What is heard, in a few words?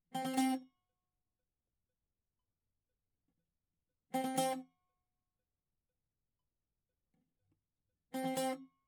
bouzouki